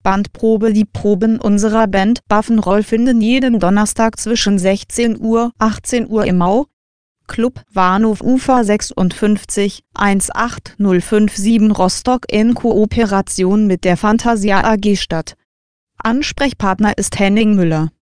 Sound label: bend